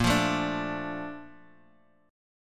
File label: A sharp Major 7th, Guitar, Open D